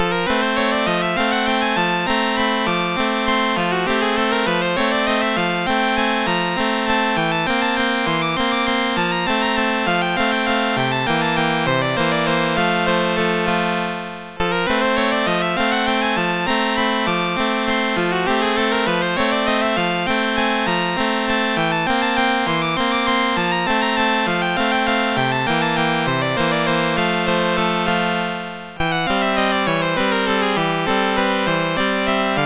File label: Valse Chromatique, MOS6581R3; Joseph A Grau